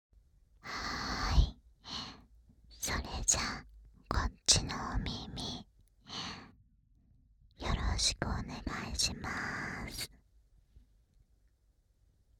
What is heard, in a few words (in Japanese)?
極・耳舐め 右耳用, ASMR